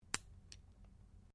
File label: NAIL CLIPPERS CLIPPING TOENAILS; Miscellaneous Human Sound Effects; Miscellaneous Human (Sound Effects:Human)